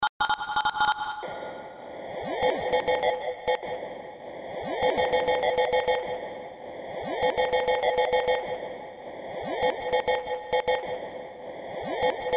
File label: Sine Wave